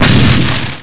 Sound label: ROCK